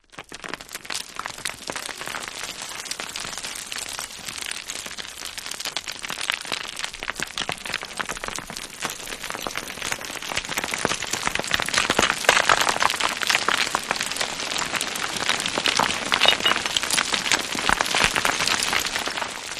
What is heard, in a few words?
Landslide, Small Pieces, Close Up, Dry Short Loop